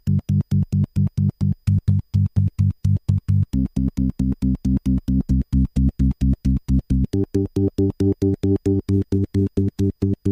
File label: notes lower